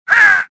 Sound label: choke, worker; worker3